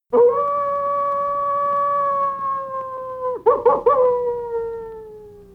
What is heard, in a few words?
mammal sounds coyote